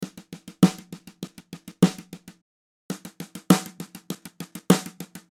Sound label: Bright Drum